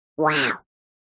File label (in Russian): zvuk vau multyashnyi